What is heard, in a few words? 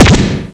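reverse drum; robocop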